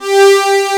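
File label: G3, SYNTHESIZER, analog STRINGS; AKAI S6000 CD-ROM - Volume 1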